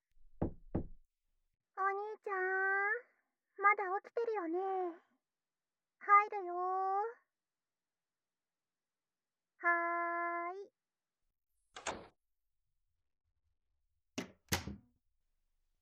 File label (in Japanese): 禁断耳舐め, ASMR